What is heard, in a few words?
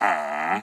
Blacksmith Action